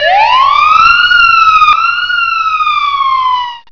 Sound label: siren